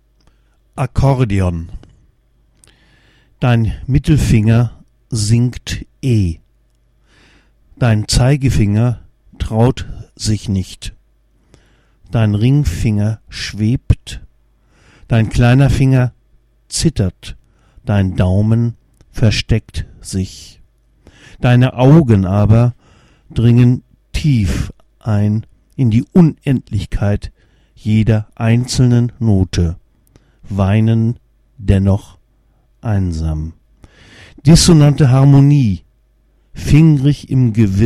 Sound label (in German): akkordeon; Esterwegen